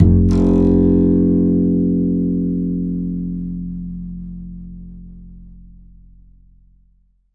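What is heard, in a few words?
double bass F2, Jazzistic; Univers Sons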